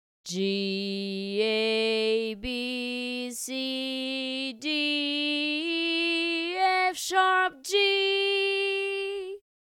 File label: sung scale